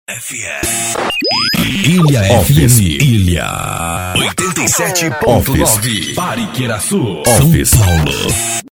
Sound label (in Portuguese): vinheta